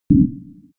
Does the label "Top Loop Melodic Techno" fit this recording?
No